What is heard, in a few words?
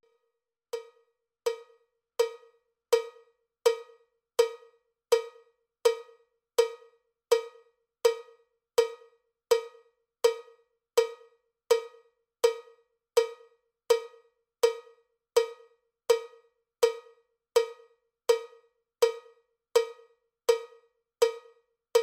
cowbell click track bpm 79; cowbell click track bpm 82